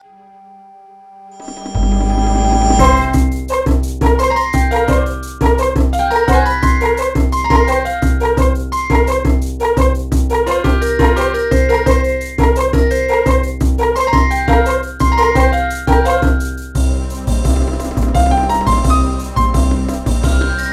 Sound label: Dramatic Mix; Clavy; nds